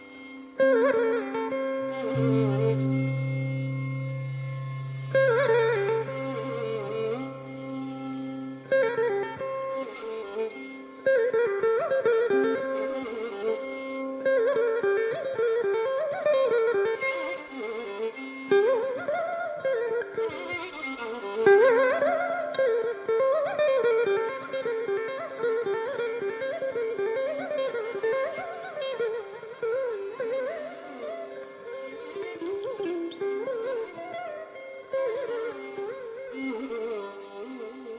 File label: Climax in Kambhoji, ālāpana; Climactic moment in Kambhōji rāga